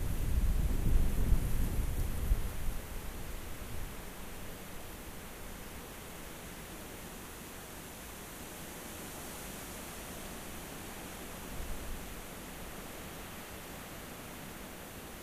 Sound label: rayol farm; SEAMED